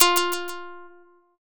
shamisen

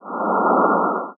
smoke

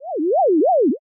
flashback out

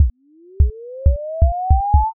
pulse

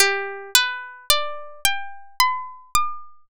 harp

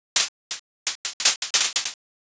firecracker

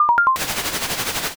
fax

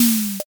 drawer

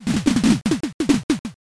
steps crowd

